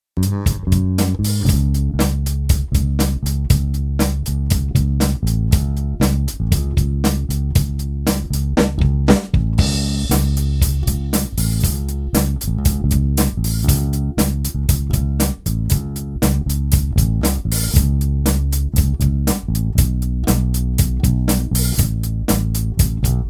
High Band plus Air Schaltung

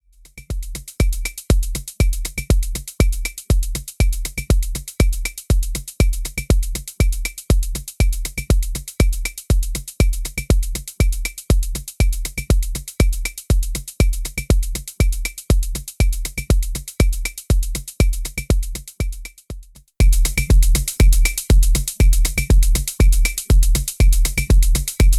berlin techno; boss transformation

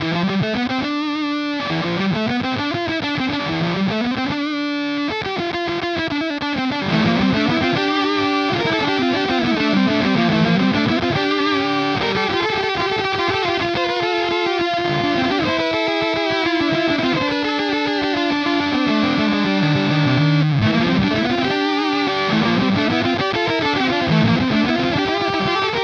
Beetronics Vezzpa Fuzzz

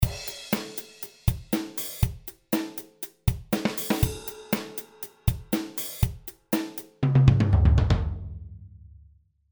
metal kit

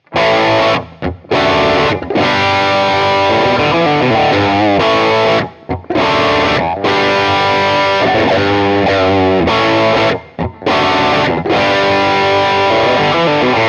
Scuffham Amps S-Gear Overdrive 1x12 AlNiCo Blue; The Duke

